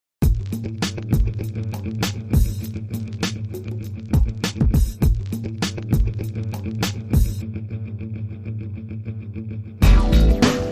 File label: No Vocals SFX